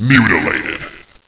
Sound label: mutilated